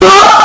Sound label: screaming